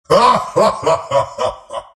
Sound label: laugh; dota; visage